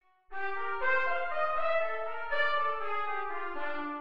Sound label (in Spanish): Dominante en C